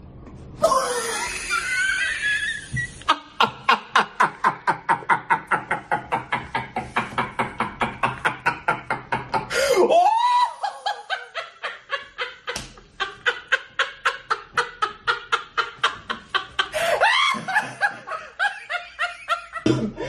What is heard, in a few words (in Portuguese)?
risada